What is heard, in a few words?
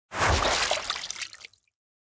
duckboat sprint; anemoi